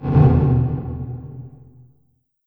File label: LH MT909, REVRB LARGE HALL; TR 909